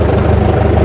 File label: Tractor Sound; Peanut Butter - How It's Made